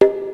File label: DRUMS; Rubber Chicken Software Sample Bank